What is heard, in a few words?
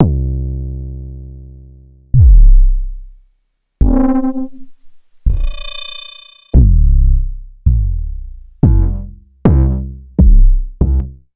Kick c